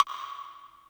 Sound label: Woodblock H, Yamaha PSR E305